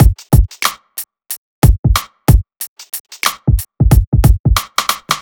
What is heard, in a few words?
BEAT, Hip-Hop & R&B; USB Soundscan vol.47; AKAI; HIHHOP